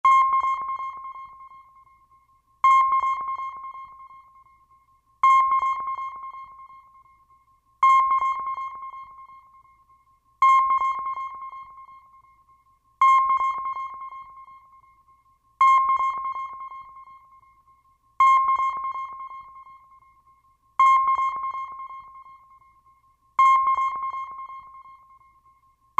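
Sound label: submarine sonar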